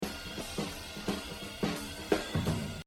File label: Exercise, jazz drumming; Exercise 21 Buddy Rich - 72%; The Evolution of Jazz Drumming (Gottlieb)